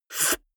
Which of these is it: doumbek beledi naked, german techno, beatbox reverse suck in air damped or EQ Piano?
beatbox reverse suck in air damped